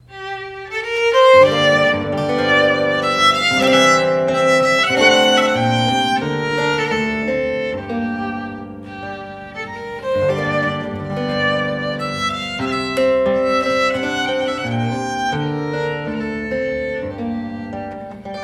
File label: Passacaille, Zither; (live); Violine und Zither; Robert de Visée